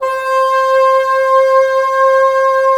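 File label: WND BN, wind bassoon; LA Composer vol. 3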